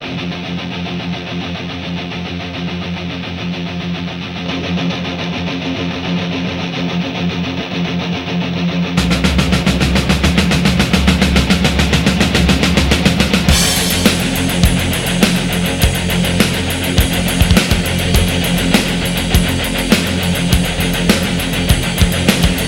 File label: rock